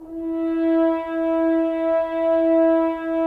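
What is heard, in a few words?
Solo French Horn, Brass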